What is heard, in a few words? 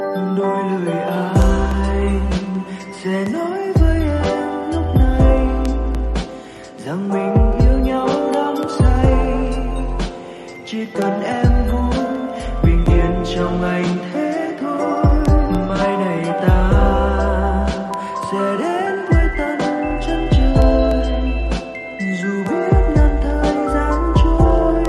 Lofi; nhac chuong